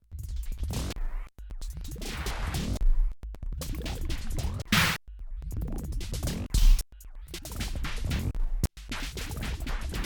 Erica Synths Black Hole DSP2 Drums delay time modulation